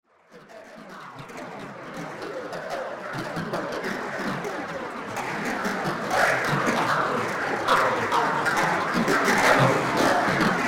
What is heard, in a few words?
The Chaos Engine, evil sound; science fiction sound effect; Andrew Fleming